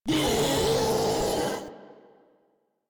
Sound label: Proto Infested Ancient Vocal Attack, Enemies; Warframe